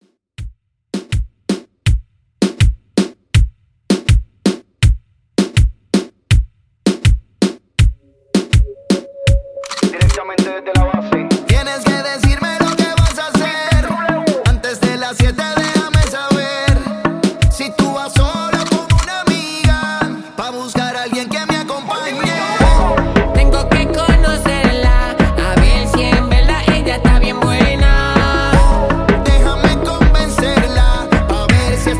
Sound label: In Outro Reton 81Bpm Clean